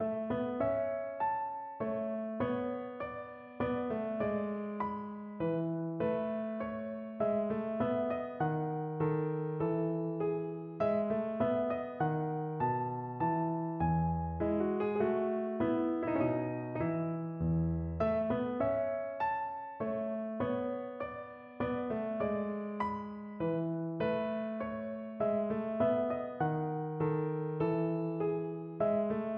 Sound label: Menuett, piano